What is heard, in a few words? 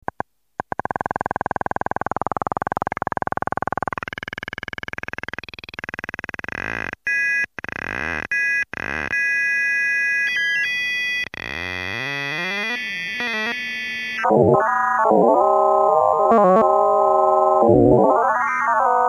synth lfo; Talking Synth module